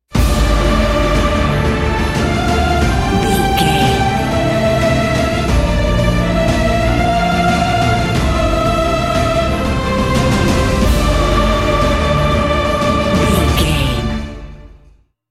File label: epic cinematic